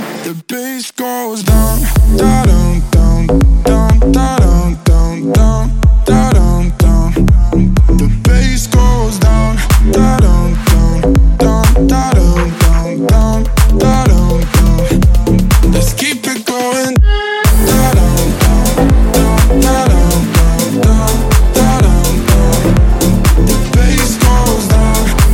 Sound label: bass goes down